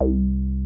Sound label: TB303; Zero G; Man Machine